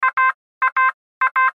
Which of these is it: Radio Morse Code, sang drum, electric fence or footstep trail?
Radio Morse Code